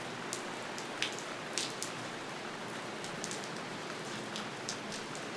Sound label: Rain spatter; zps; Ambient